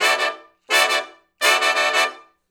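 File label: Funk Riff (D) harmony, Horncraft for R&B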